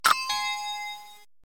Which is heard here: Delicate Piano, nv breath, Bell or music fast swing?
Bell